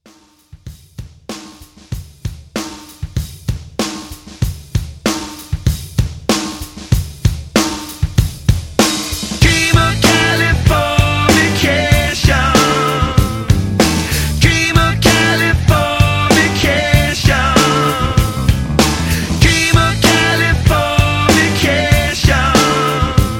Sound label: Hype Re Drum 96